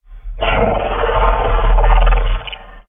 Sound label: breath; gonarch